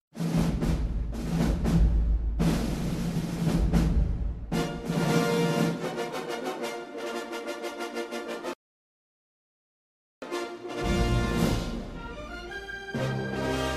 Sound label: Movie Opening